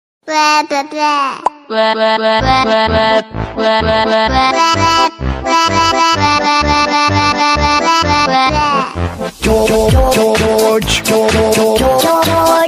gummy bear scream

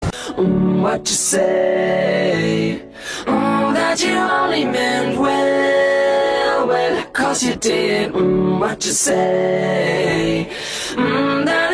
mmmm

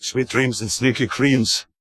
sneaky

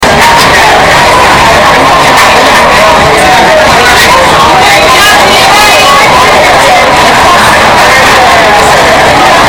crowd loud noise

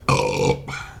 super mega burp

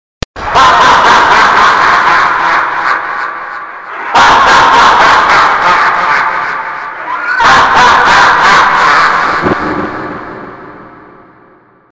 dark haha

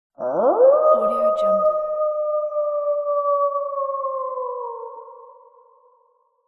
werewolf howling

